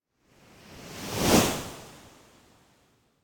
Wave By Medium, Fast And Thin Wave - Passing L to R Wave Whoosh; Hollywood Edge; sound designer's toolkit v2